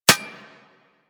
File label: Bullet Impact Generic Metal; Surface